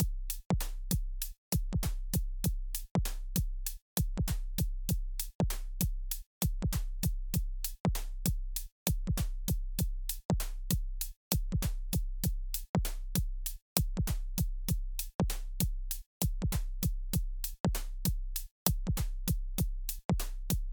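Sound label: Eventide Drum Omnipressor positive Function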